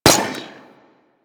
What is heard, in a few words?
Rifle Fire; Warframe; Venus; Venus Hyena Astro; Weapons